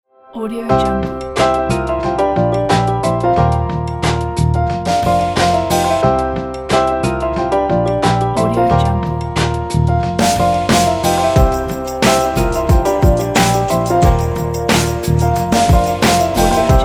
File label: chill jazz hip hop